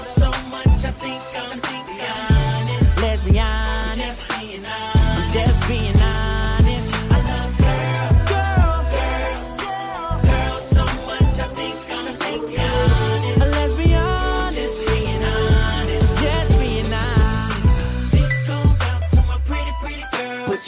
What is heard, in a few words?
music ringtone, english ringtones